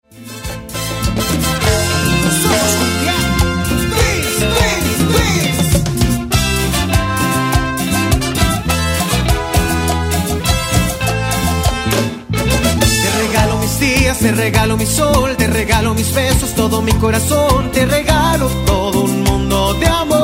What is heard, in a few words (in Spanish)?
Cumbia